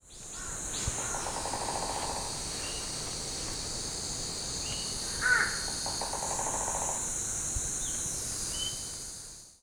nogutigera, bird song; ノグチゲラ; ドラミング; 沖縄県国頭郡国頭村; J-eco 鳥のさえずり図鑑

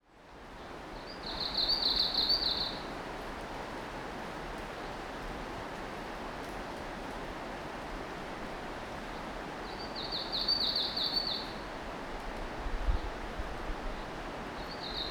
mebosomusikui, bird song; メボソムシクイ; さえずり②; 尾瀬

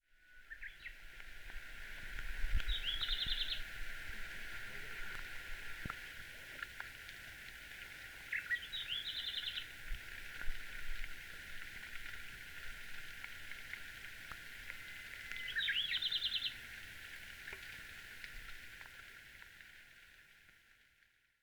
ruribitaki, bird song

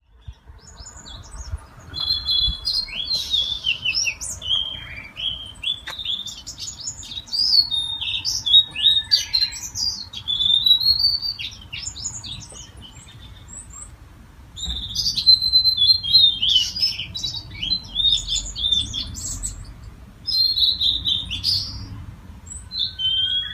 kuroutadori, bird song

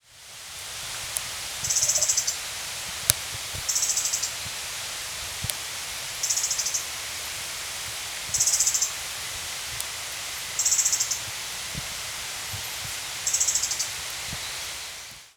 yabusame, bird song; ヤブサメ; 新潟県新潟市秋葉区 菩提寺山

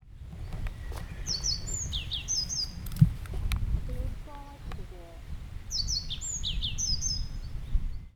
noziko, bird song

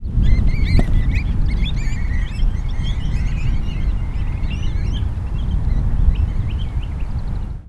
munaguro, bird song

